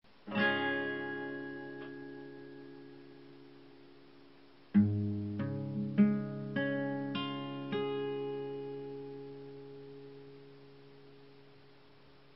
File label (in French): Accord C diese